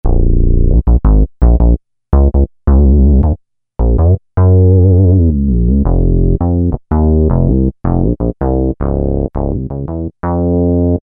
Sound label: Mini Bass, Korg MS2000; Factory Patches